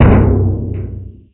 Big Exp Int

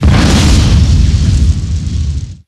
Small Explosion

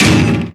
All Hits

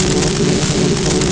PPC Explosion

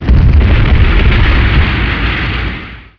Mech Explosion

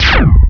Laser B Fire

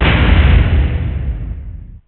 Collision Ext

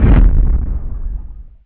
Foot Fall Int

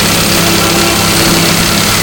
Laser Hit Craft

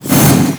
All Explosion

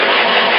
HVAC

